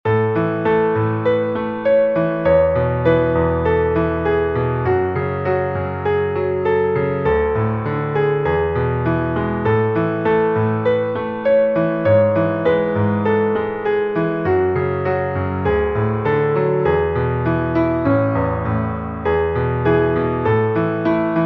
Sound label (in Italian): chitarra Piano; Inno alla Gioia; Beethoven